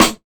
raw snare drum g sharp key; snare drum samples

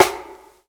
piercing snare single hit f sharp key

